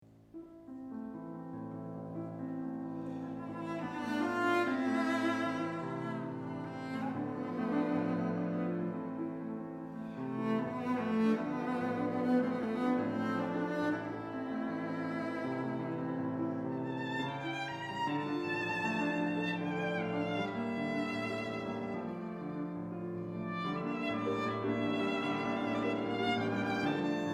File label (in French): Trio pour violon violoncelle et piano; Tchaikovsky; Trio op 50; pezzo elegiaco